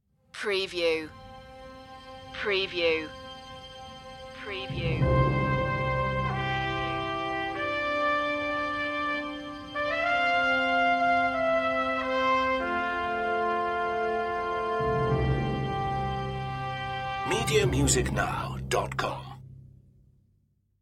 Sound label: american frontier stinger